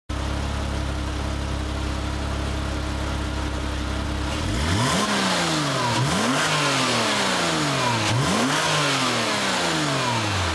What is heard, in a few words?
Porsche Cayman S engine